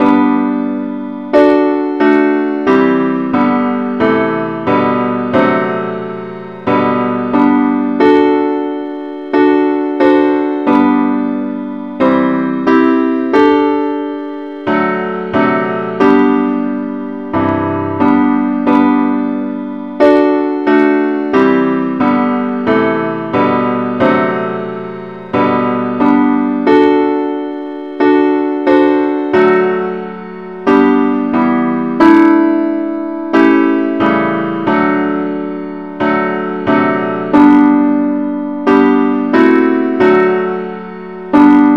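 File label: gwalchmai advance Acc key g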